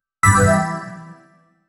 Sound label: create a sfx for ui, casino